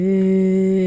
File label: eeeeeeehhh